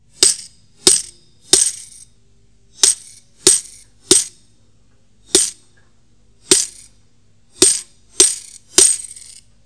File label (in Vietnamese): Sênh tiền